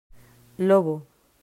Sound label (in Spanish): lobo